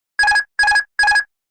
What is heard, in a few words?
airbus autopilot